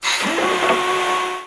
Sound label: tesla open; quake2